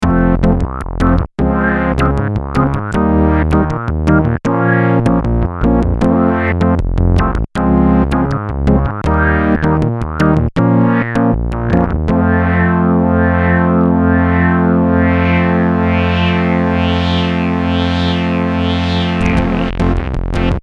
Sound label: Rubber Rez, Prophet '08